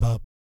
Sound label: BAP A#2, Vocal Jazz Fx; Spectrasonics; Vocal Planet Vol.3 Jazz Fx